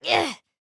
AHurt

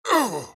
AHurt; Black Adam

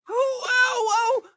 AHurt

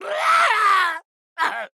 Hurt

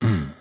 Movement Land; Batman

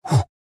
Punch

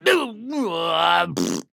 AHurt

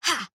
Attack Small; Arya

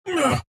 AHurt

